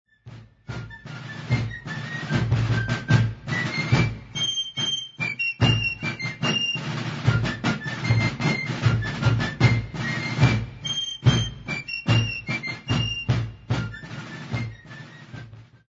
March Charming Moll, corps of artillery field music